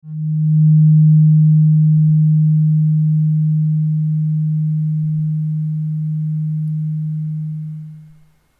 eros 15466 hz diapason therapeutique

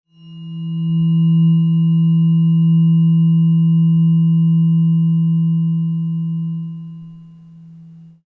hopi 1649hz diapason therapeutique

